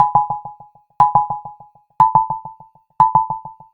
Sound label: Alarm; GPSMAP H1